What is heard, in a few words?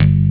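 PICK AC BASS; Pro-Rec - Synthsonic; TRINITY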